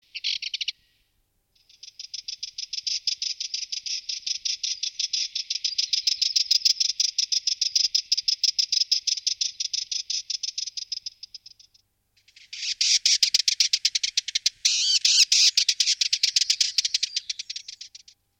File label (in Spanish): Curruca cabecinegra, pajaros